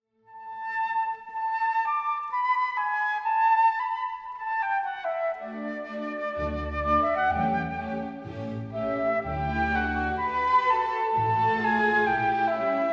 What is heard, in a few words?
Flute Concerto; Mozart